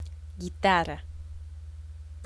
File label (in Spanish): guitarra